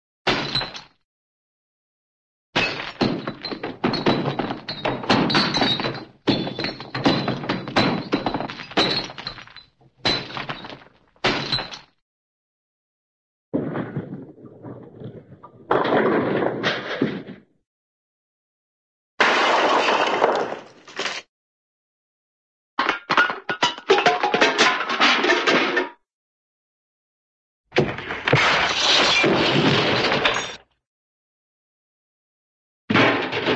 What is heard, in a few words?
crash, bottle glass bottle drop; cartoon